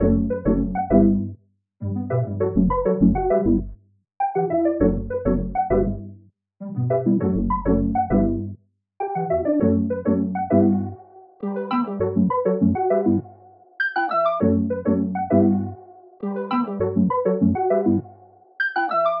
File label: 100 BPM D Maj, Melody; Cymatics; 2022 Melody Collection